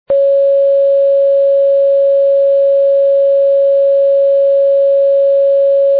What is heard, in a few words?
C sharp 3, recorders; tuning